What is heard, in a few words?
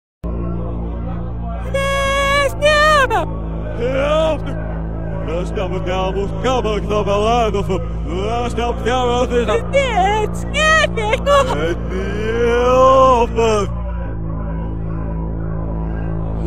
death